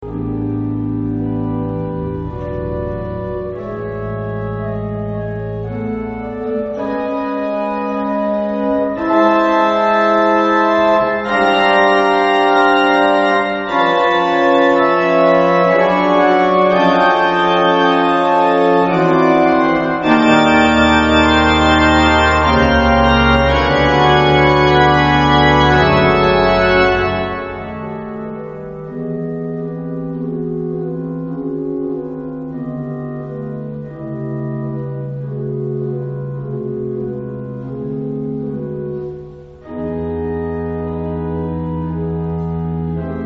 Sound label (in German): nacht ist kommen orgel; Version für Orgel solo